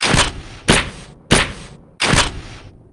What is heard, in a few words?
camera shots; mamemomi